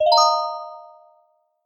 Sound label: achievement bell